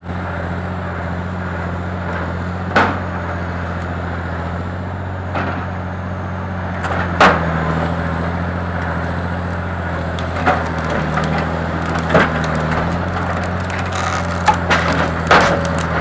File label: industrial logging knockring processors mashing wood for paper; sound effects one